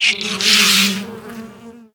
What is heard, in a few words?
Weaponized Wasp Play